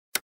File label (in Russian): knopka vkl vykl radio